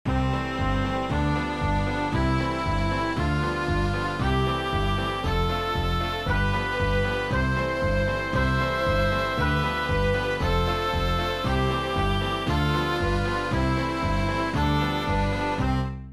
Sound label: Scale